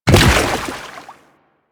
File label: Step Water, footsteps; Warframe; Grineer